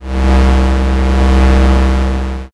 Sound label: space engine